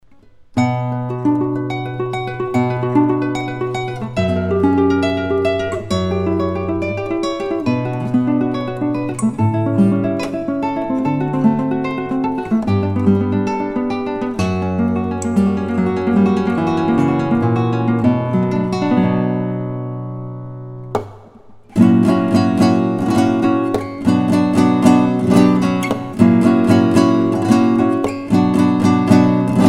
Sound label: Flamenco Punta y tacon, classical acoustic guitar